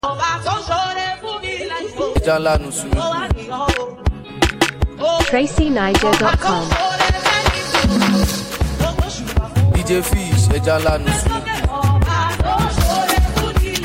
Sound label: Speedup Beat